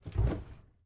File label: Touch A Bench; LOOPERS